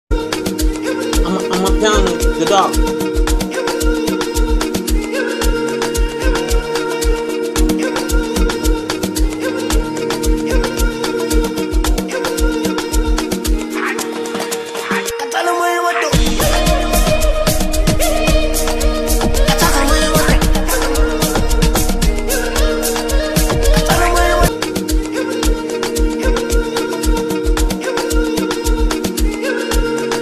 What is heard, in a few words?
Amapiano